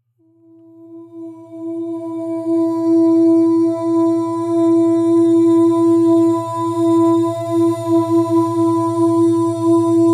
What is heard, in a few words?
wave binaural Alpha; Acturian